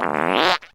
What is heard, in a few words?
fart short